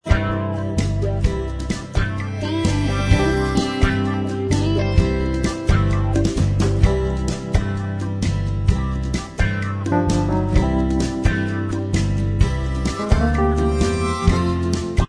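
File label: instrumental track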